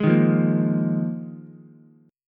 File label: Bb 7th Suspended 4th Ukulele, chord; strummed; JGuitar